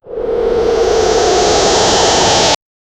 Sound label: warp engine sound at firs, spaceship